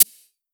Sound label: Perc Shaker Tight, 909 Percs, Vintage Drum Machines; Slate